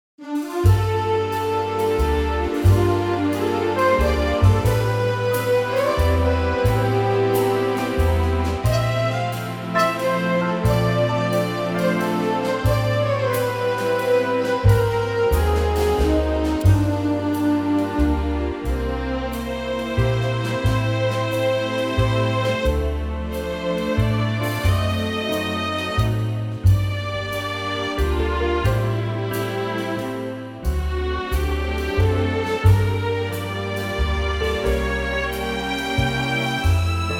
F orchestral; key - F - vocal range - C to E; Gorgeous orchestral arrangement

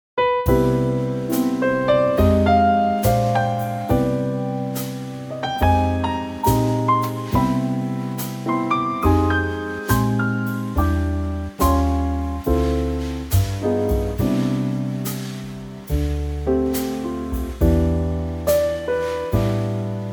C trio

